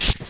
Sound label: Blade Shoot; DoukutsuNikki; game english